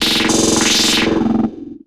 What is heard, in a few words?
filter roll